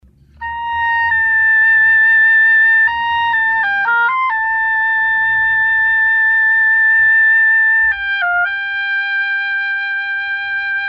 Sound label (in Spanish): oboe